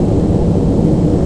airplane; Tony Hawk's Project 8; XBOX

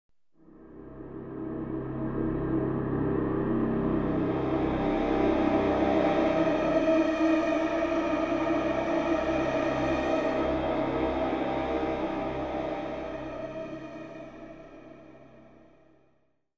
theme wail; Myst III Exile

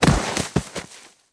bodyfall grass, foley

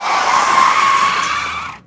pwrslide; Cars; XBOX

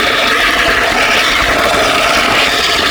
slide metal, terrains; Tony Hawk's Project 8; XBOX

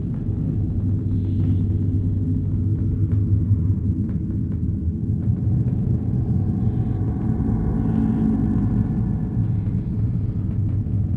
pipe; Terminator 3 Rise Of The Machines; XBOX

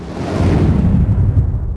cigarette burn; Max Payne; skin items

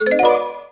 Pickup

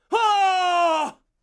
Cry KNOCK; TrueCrimeStreetsOfLA; XBOX

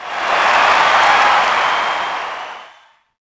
POS Reaction,Crowd; Cars; XBOX

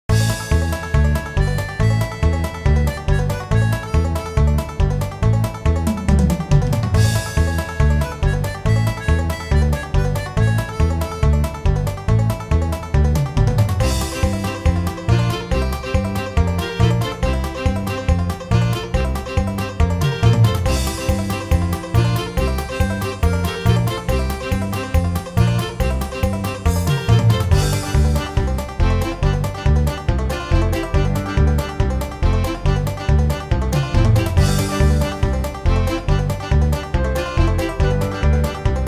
Pinball, Music; Pure Pinball

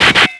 extra trick; Tony Hawk's Project 8; XBOX

